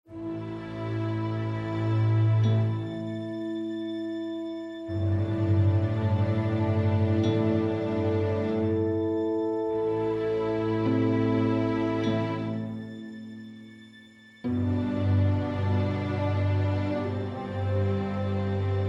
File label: Loop Caution